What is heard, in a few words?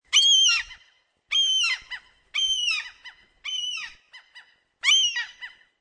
Two Seagulls Cawing Several Times, One seagull caws, the other kind of cackles or barks, several t; No ocean waves or any other background noise; Bird